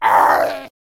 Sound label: Farmer Death Vocals